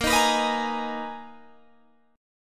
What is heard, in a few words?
B Major 13th Bb, guitar